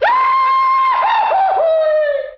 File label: scream goofy; ooh ahh